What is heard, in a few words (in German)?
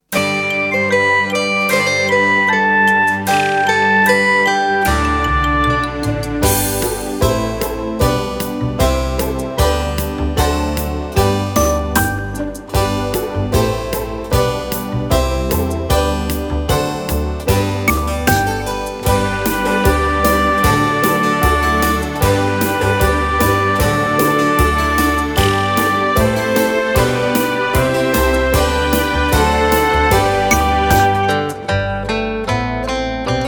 Ein Männlein steht im Walde To play along with, recorder